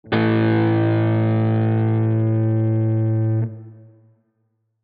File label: a power chord